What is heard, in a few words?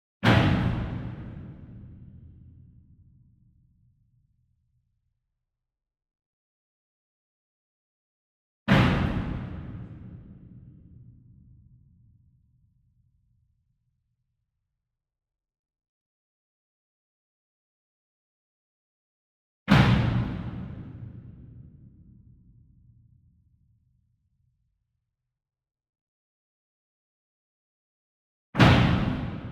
SINGLE DRUM Verdi 66 Inch Flam Soft Beater Far; boom library; Cinematic Strikes Construction Kit